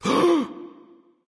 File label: nv surprised, vox; smite; voicepack; vulcan